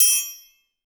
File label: TRI STC LO, orchestra; Best Service; Peter Siedlaczek's Advanced Orchestra